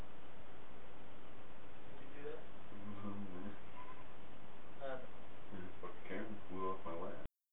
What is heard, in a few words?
Weird Scream